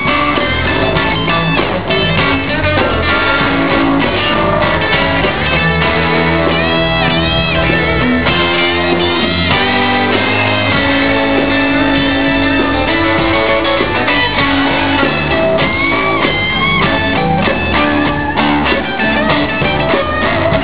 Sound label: guitar; California; Marin; ClosingNite